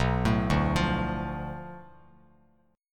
B Minor 7th Flat 5th B Bass Standard, chord; Bm7b5 arpeggiated; 7,12,0,10; JGuitar

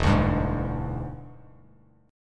E 7th Sharp 9th E down Bass Standard; E7#9 strummed; JGuitar

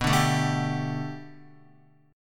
B Minor 7th Sharp 5th B Guitar, chord; Bm7#5; strummed; x,2,2,2,2,3; JGuitar

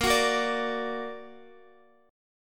A sharp Augmented Guitar; strummed; tuning 4E,4A,5D,5G,5C,6F

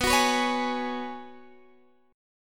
F sharp Suspended 2nd Suspended 4th B

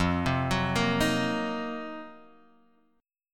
C Minor 7th Flat 5th F, chord; Cm7b5/F arpeggiated; JGuitar

